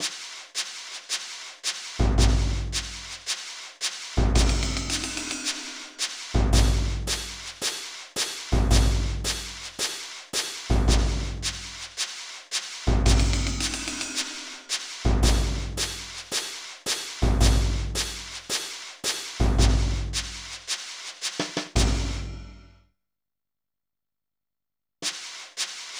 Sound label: Drum Practice Music; Legend of Zelda, The : Majora's Mask